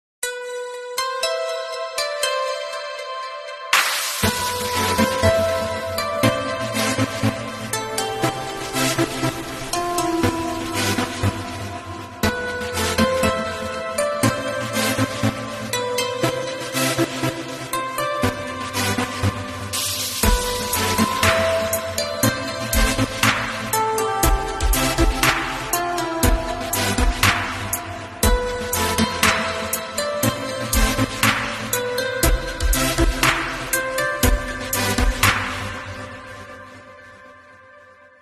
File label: Mobile Ringtone